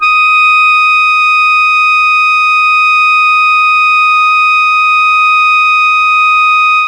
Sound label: D#4 ALTO SAX, Mellotron; Mike Pinder - Mellotron Archive CD; CHMB ALTOSAX